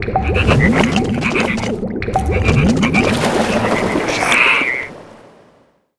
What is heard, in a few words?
Dark Fog Die; Seer; GamigoNA